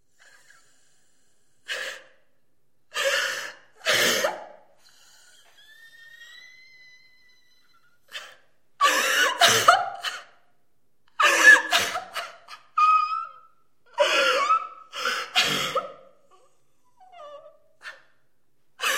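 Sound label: woman crying sobbing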